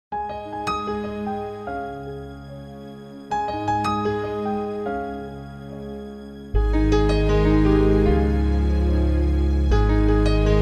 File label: music